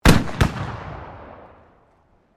MUSKETS CAPLOCK 45 CAL HEAVY CARTRIDGE FIRING DOUBLE, at a shooting range; Black Powder firearms at a shooting range; Gun Shots